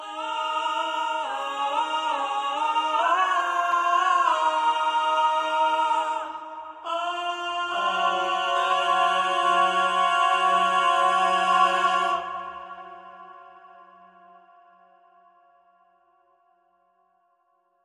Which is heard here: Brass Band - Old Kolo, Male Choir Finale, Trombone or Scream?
Male Choir Finale